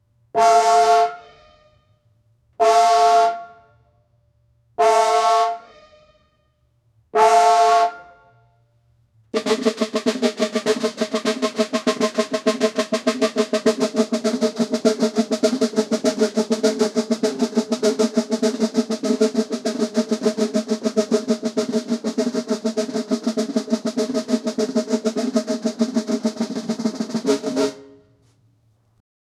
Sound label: military ceremonial guard